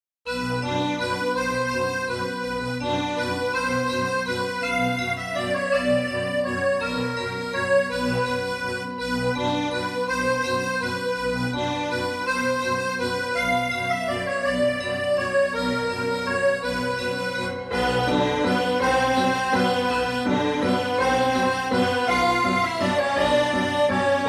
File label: Creepy Carnival